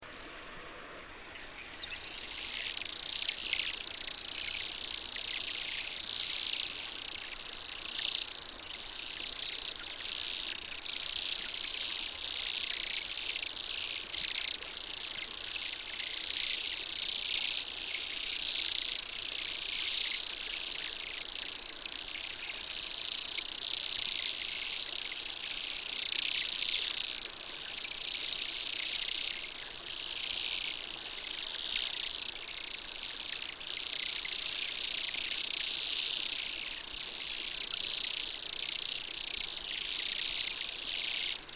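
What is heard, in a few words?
waxwing voice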